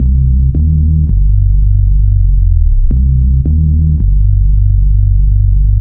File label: Down swoop 165bpm Dm, Drum & Bass, Bass Loops; Total Drum&Bass